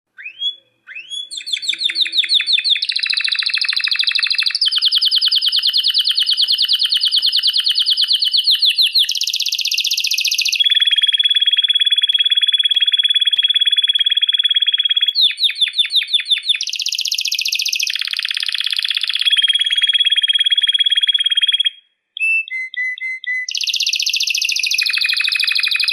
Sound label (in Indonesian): kenari jambul